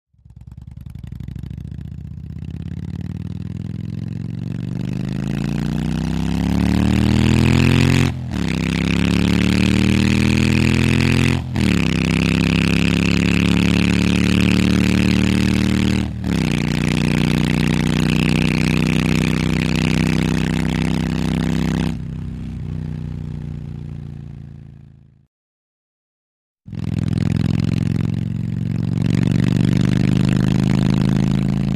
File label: Riding a motorcycle